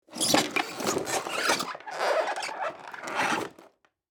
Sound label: Squeaky wooden door handle movement